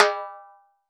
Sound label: Timbale Hi, Roland BK-5; Percussion